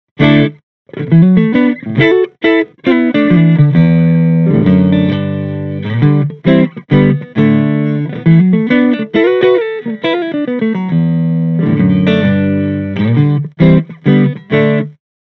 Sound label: Mic Inside Jensen C12N Clean Stratocaster; Mini Rox